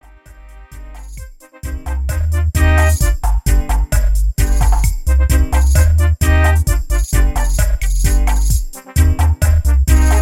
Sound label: Stand Firm Horns